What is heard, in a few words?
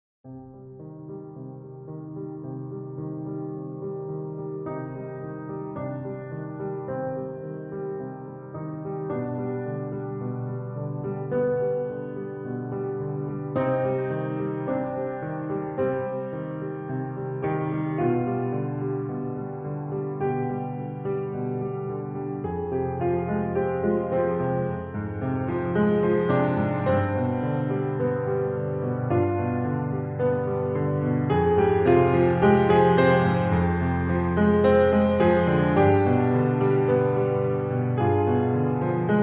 piano